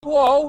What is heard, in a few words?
oowhoah